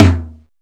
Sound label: drum, b5, YAMAHA R5 RX7, DRUMCOMPUTER; Retro Sampler